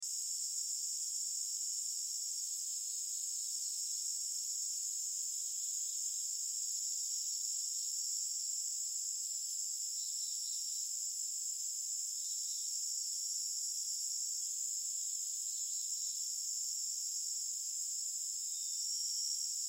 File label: Forest Birds; Forest Birds Crickets Insects Loop Nature; Forests And Jungle; Ambience; Blastwave